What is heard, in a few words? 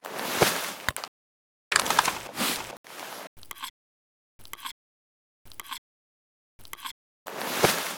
conserva use; Stalker Anomaly; item usage